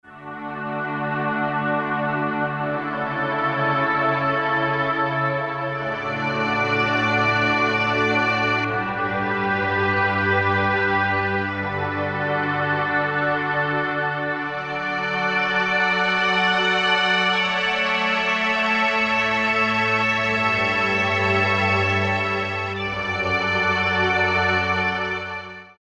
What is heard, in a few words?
SectCh, Korg DSS-1 Strings